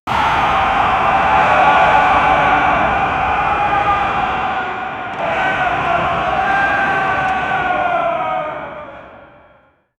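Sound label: ancient battlefield ambience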